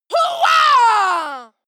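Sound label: female combat attack long, screams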